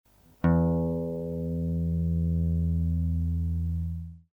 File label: guitar e grave; accordeur